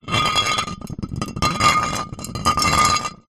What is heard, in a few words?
bottle wine drag movement pebblecrete; zapsplat